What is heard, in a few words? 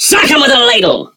attack; Awesomenauts; Commander Rocket